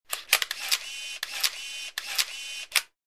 35 mm Camera: Multiple Shots, From Close Perspective